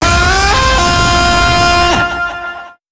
swamp sound effects death

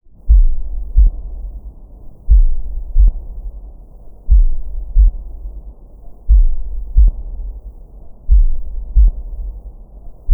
Prison ambient

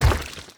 Honey Pillar Hit

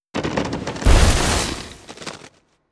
Roots Spell; Treant

